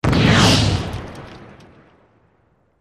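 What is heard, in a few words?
Explosion Wood Debris; wood & sand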